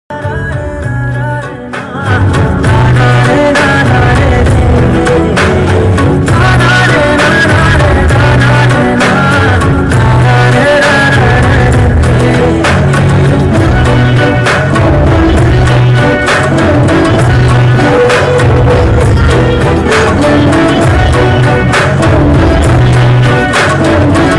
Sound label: love bgm ringtone; Ooru Peru Bhairavakona movie